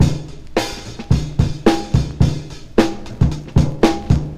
110 bpm breakbeat sample f key, kick tuned to the F note. Loudest frequency: 582Hz